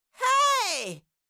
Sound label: Cartoon little child voice shout scream short